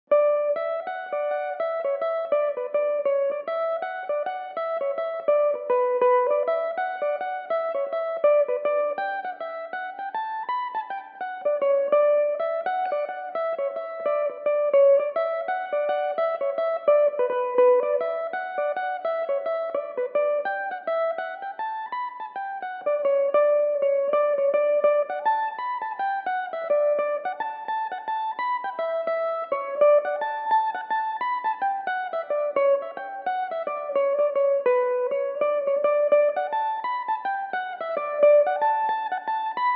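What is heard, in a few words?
D major B minor belmuse, irish mandolin; The Achill Lady; original tunes written and played by aidan crossey; Belmuse EM-190 electric mandolin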